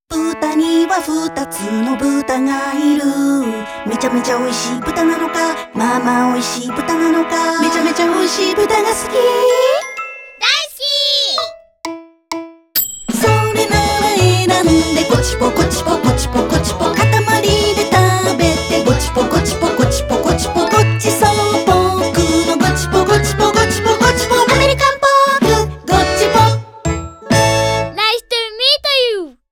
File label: song